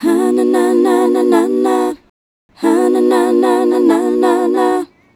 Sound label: Female Vocal